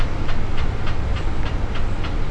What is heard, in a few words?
fan loop; cstrike